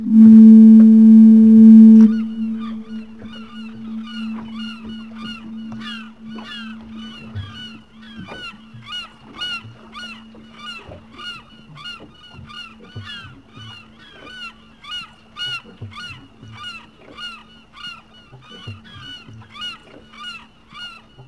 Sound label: Amb Foghorn Gulls; Bruce Lee Quest Of The Dragon; XBOX